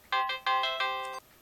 samsung sghe700 ringtone

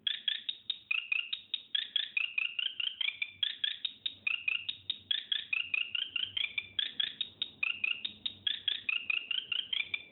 nokia 1100 ringtone; crickety

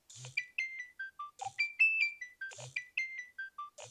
nokia 6100 ringtones knickknack

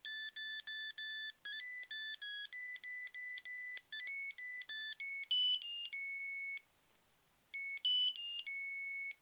ericsson t39 ringtones

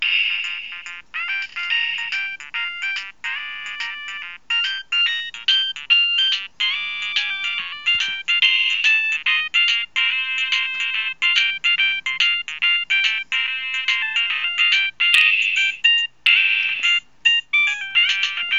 nokia3510i bowling ringtone

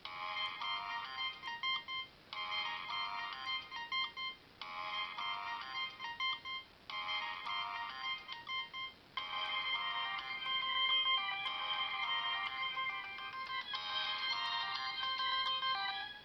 motorola razr ringtones digital signal

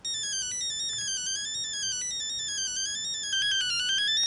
nokia 3210 bumblebee ringtone

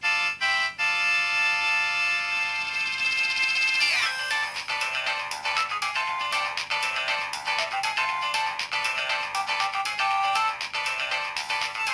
nokia1200 mars ringtone

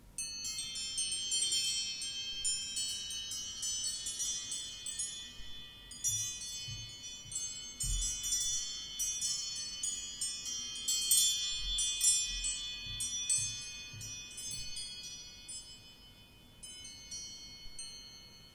chimes ringtone; apple iphone 5

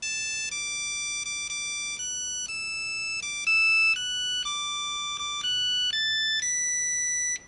nokia 3210 auld lang syne ringtone

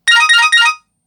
Triade

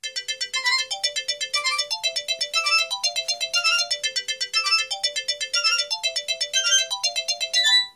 bell ringtone; samsung sgh t100

